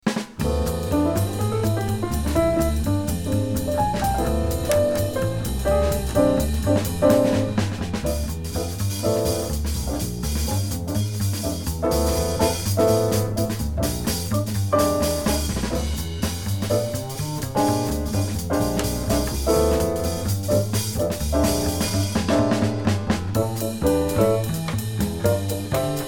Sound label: minus melody